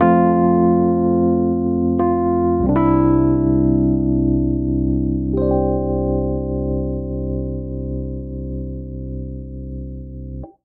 Cadence Simple IV I C Major 90 bpm, Electric Keyboards; MIDI Clips; Ableton; Factory Packs